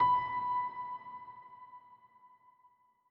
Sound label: piano note; beacon pines